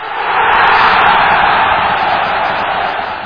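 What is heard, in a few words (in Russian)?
толпа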